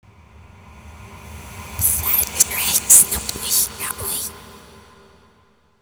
reversed; slender

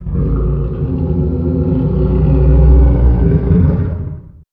garg growl; slender

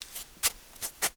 slide; slender; zombiebm

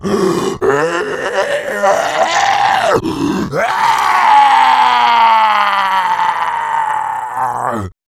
moan; slender; zombiebm

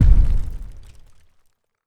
garg step; slender

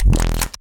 shotgun reload; tron mod; weapons

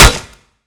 new hit; slender; cof

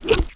claw strike; slender; zombiebm

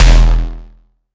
shotgun shoot; tron mod; weapons

